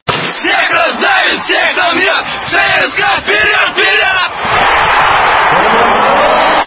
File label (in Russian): Vseh razdavit CSKA vpered